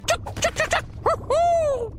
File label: Fight Cheer